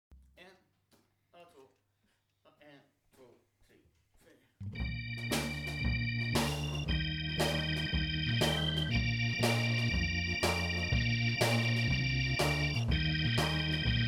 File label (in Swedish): Virvelshuffle; utan orgel